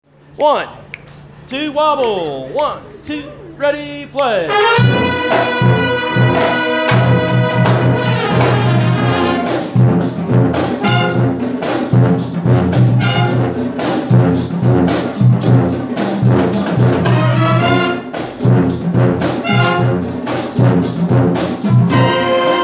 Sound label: Wobble